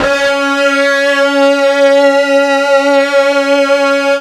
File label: FUZZ C#3, ULTRA FUZZ, Guitar; East West; Steve Stevens Guitar Samples Collection